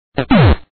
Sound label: SFX Fall